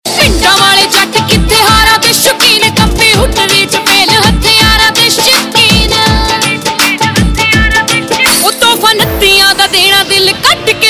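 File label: Ringtone, Punjabi Pop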